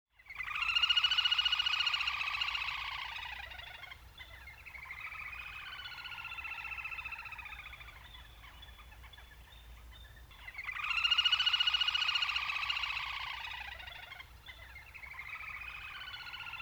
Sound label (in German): Zwergtaucher Balzrufe